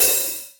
crash sound clip a sharp key, Royality free crash cymbal audio clip tuned to the A# note.; cymbal drum samples